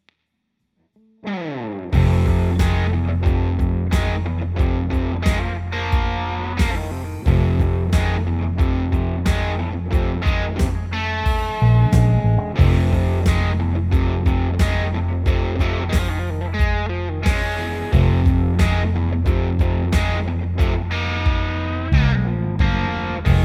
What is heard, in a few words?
Shanti Blues, blues